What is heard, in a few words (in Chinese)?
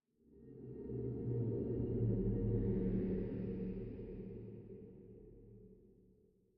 绯红森林嗡鸣声; ambient